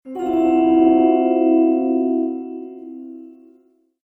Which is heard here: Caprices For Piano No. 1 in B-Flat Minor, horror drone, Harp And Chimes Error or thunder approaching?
Harp And Chimes Error